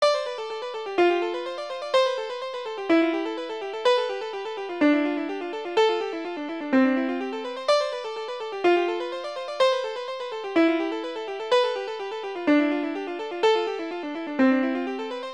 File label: scales